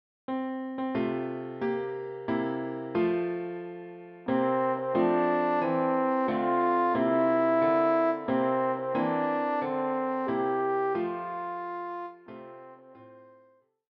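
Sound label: horn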